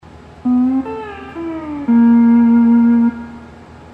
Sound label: hawaiian piece